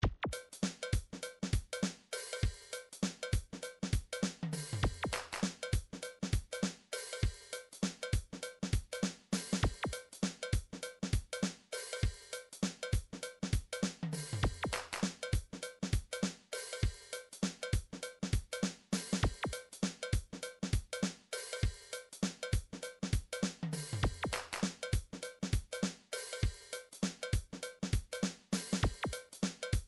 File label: drums house, midi drums